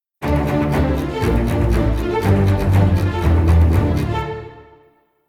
Combat In; Skellige

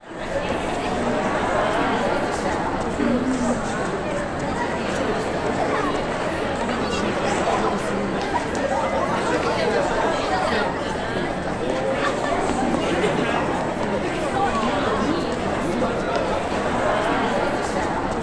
Ambient BGM

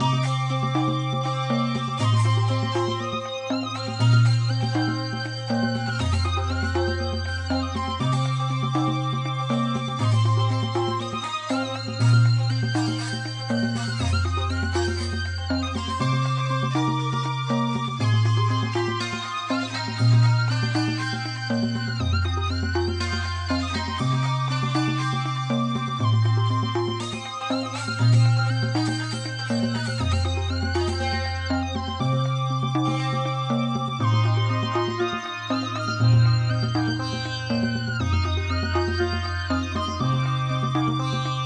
Boss Music; crouching pony hidden dragon

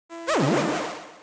pain

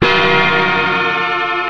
Aggro Agg stab guitar; Outdoors Old

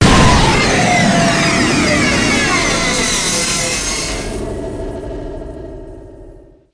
Powerup; Zorina

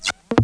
Backpack ui symbol move; gonner linux macos ps4 switch windows xbox one gamerip 2016

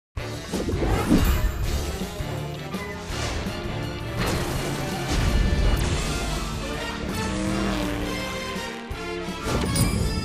Super Car; Disney Infinity

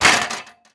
Metal Destroyed

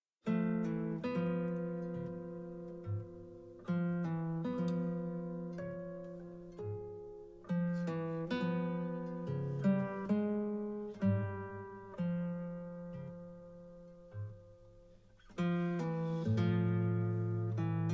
Guitar

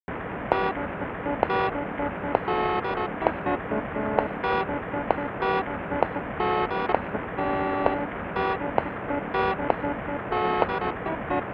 Gramophone; Clock Tower 20th Anniversary Sound Collection

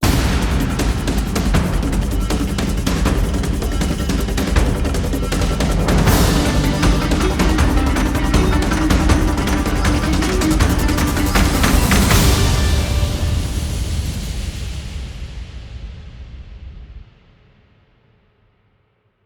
Crash Landing; bayonetta 3

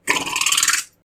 gun

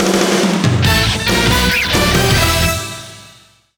fanfares

